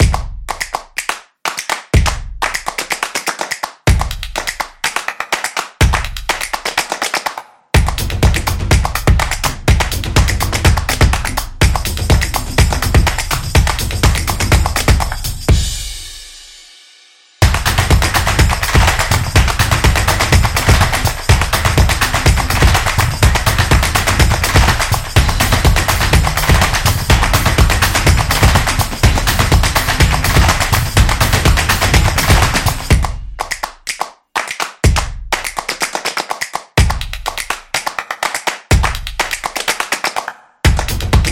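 Sport Equipment, Football percussion background music inspired by samba rhythm, stadium energy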